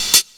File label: HH LOOP; USB Soundscan vol.27